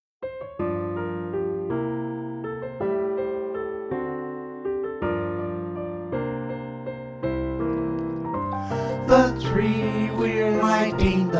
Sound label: tenor